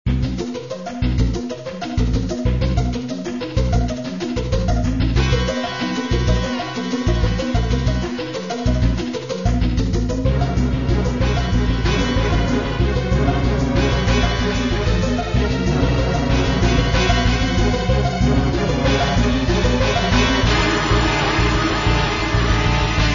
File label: Scary Music